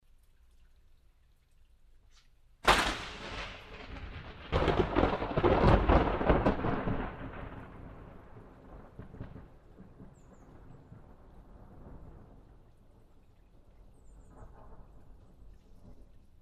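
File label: thunderstorm sounds; zvuki grozy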